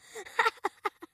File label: score laugh; gumball; Gumball - Go Long!